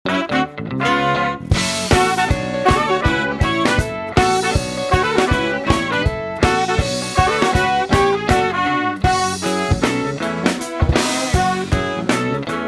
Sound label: Jingle; Canadian Bacon